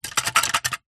office large sticky tape holder shake

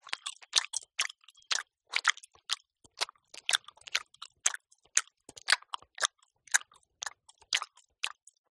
human chew chewing gum; zapsplat

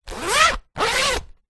plastic document wallet zipper; office

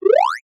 cartoon ascend short

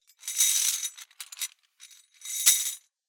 Just Chains pitched Just Chains Pick Up Drop

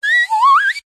cartoon slide whistle fast ascend with vibrato

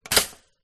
household toaster double pop up

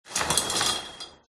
shower curtain

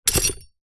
animals horse saddle set down ground; zapsplat

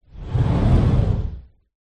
household door sliding patio

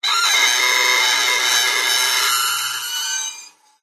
musical violin awful bow scrape nasty

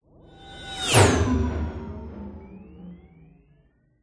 cartoon anime whoosh impact whining tail

